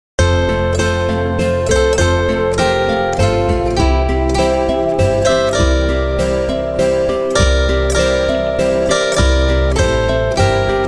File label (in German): weihnachten, zither; weihnachten mit der zither